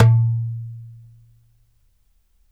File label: DARABKA, Mediteranean Traditions, PERCS